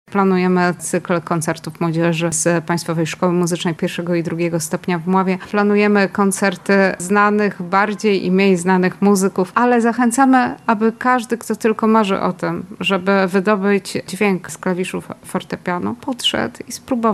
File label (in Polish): fortepian